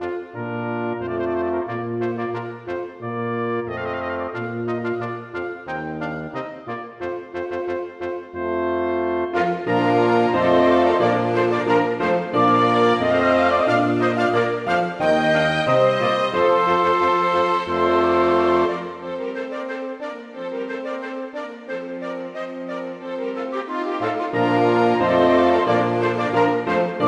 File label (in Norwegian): Marchs for stort ensemble; Bang; Christian Marchs